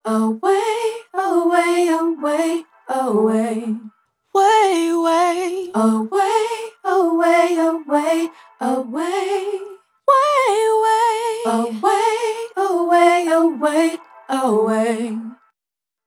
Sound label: Vocal Phrase Away - 84 BPM G Min Dry; Vocals; Cymatics; ZODIAC Beta Pack